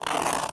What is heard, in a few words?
Rubbing Scratching Papier Verre Gros